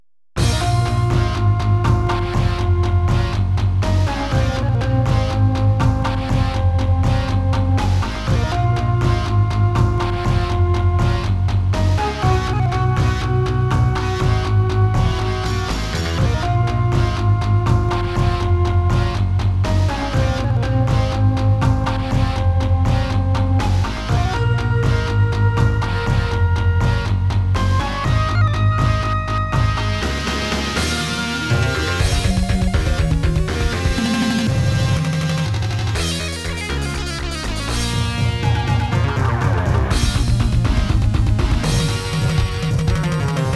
Rain Flute; Tengai Makyou Shinden; Far East of Eden; Ziria Stage

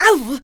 pain

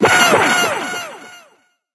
Cheerleader death; Fight Knight

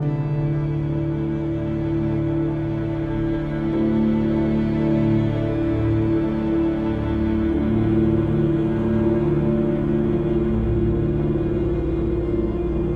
loop Tense

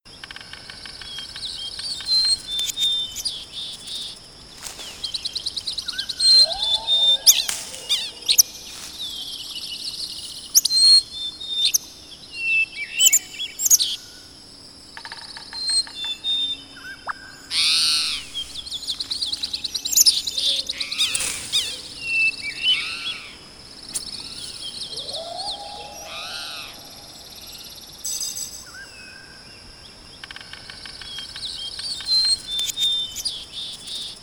(Ambient) The Woods of Light; rayman 2 remastered soundtrack

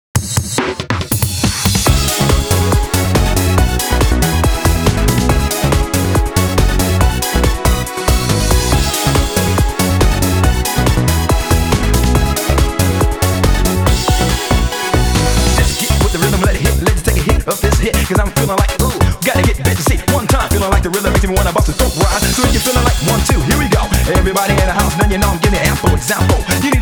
bass; jubeat

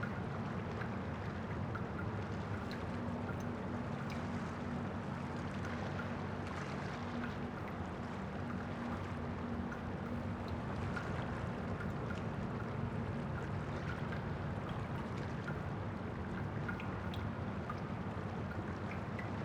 amb under bridge

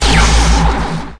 Weapons

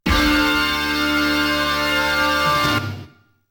Game Over; dunk dream gun hard

